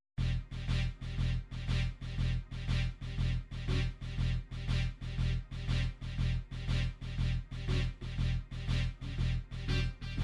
rock, midi

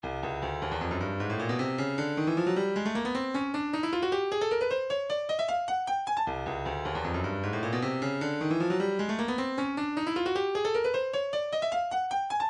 room, midi

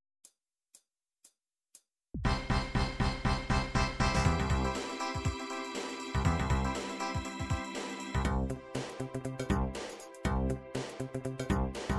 dance rap, midi

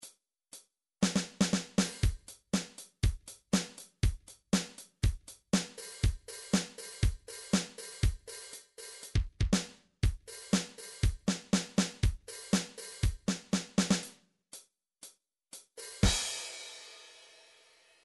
midi drums disco

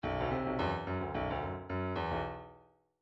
midi grooves funk

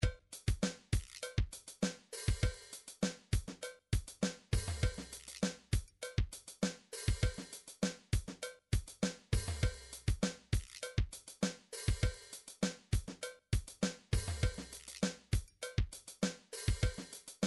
drums house, midi drums

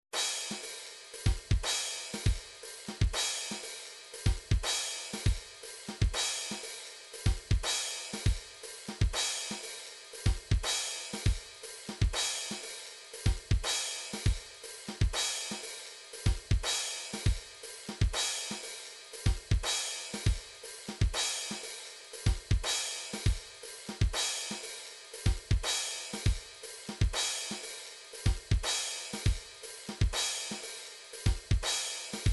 drums, midi drums

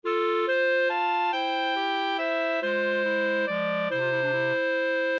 game over; gameboy; super mario land

